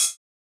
Open hat, techno drum

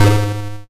YM Chord G#; ChipShop Deluxe; ChipShop Hits

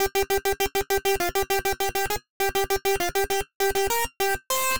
Synth Line 100 G, hiphop 100bpm; musicradar

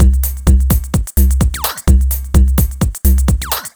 128BPM Stripped, techno; drum fx; Beats

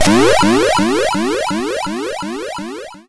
FX Alarm System; SFX; YM; ChipShop Deluxe; ChipShop Hits

